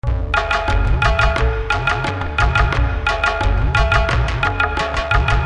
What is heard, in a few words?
tabla loop